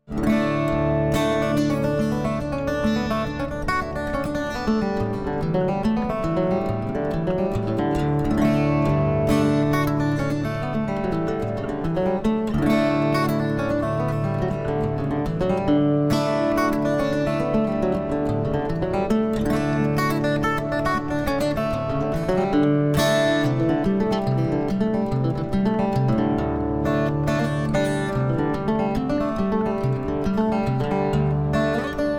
Ambient Idea; The Lost Legends of Redwall; The Scout Act II

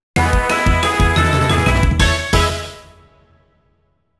Received Castle Points!; Pokemon HeartGold and SoulSilver Music Super Complete

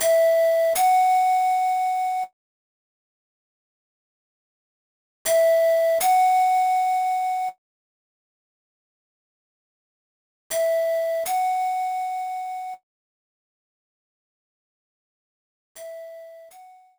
Spirit Flute Practice; the legend of zelda spirit tracks; Awakening

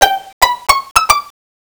COIN; the simpsons; arcade

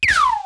creature

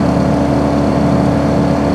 Ford Mustang High; car simulator 2

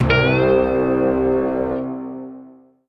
Beach End Stinger; The SpongeBob Movie Game: Sponge on the Run

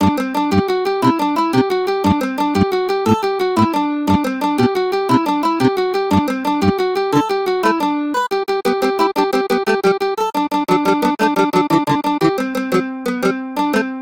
Mandolin

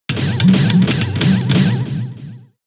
Belly Drum; pokemon sfx gen 6 attack moves xy oras

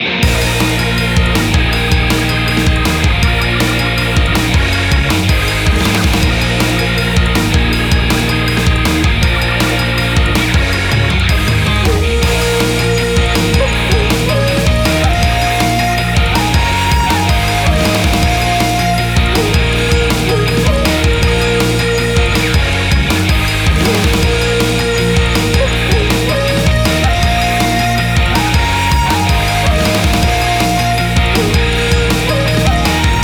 BGM Main Menu; Naruto: Clash of Ninja Revolution; Wii

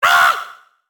gobbo pain; Fight Knight

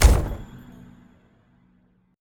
Object Place Wood; LevelEditor

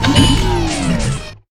Teleporter Close

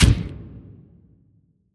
Stab; Portal Quest; android; SFX Shield Minion Attack Stab 2

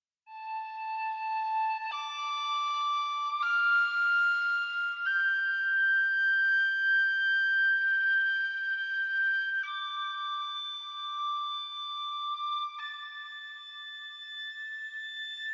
Exploration; Skellige

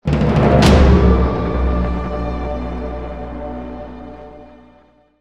Short Signal

